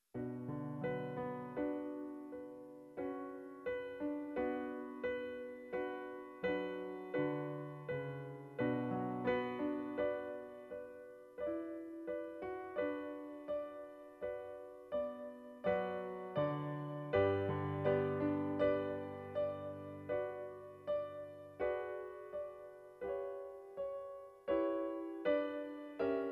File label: Prelude b minor